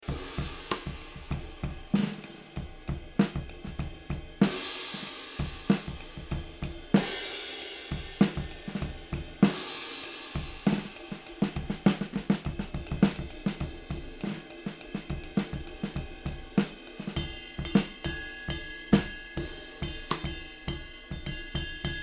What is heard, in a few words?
Neumann V476 Drums OH Ribbon; Extinct Audio Valkyr